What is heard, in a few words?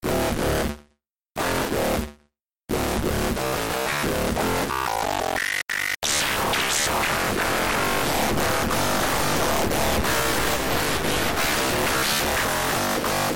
DIRTY ELECTRO BASSÉ